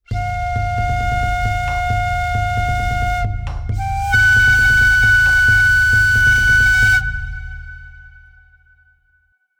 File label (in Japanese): 和ジングル